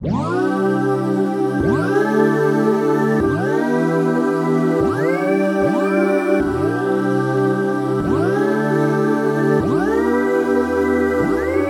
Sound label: 150 keys loop voice, Cmaj